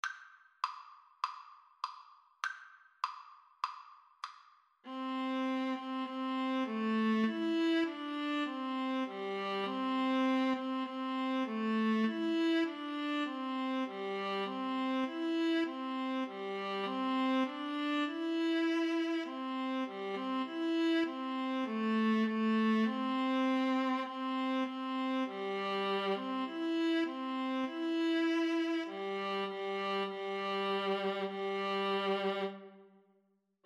Viola Duet

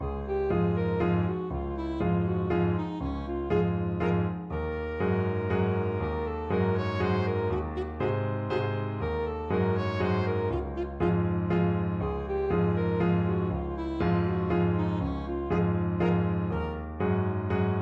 saxophone

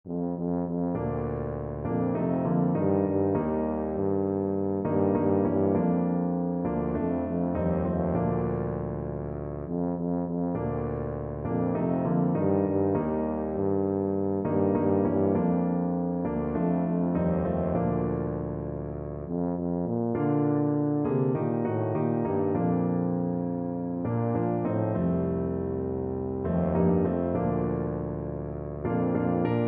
tuba

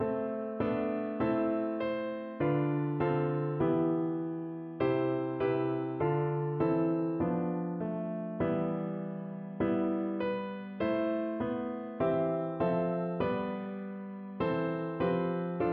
piano

